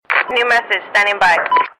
Military Simple

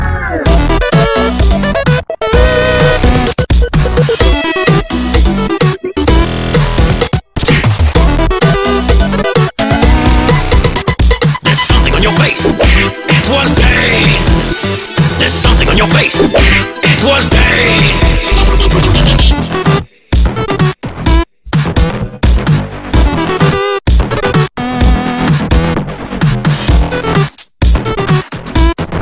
Epic song

